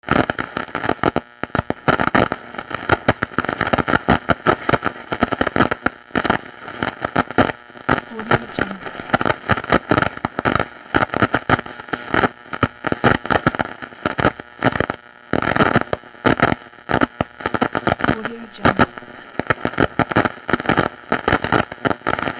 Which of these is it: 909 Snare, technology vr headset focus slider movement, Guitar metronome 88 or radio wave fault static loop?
radio wave fault static loop